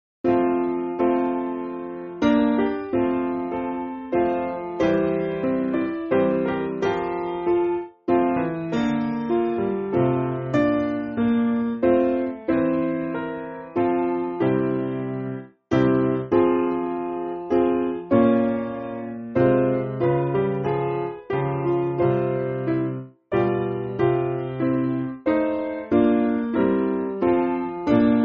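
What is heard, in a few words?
Piano, Backing Files for Churches; Bromley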